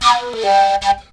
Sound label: FLUTE LIN; Best Service; Ethnic Journey Sound Cube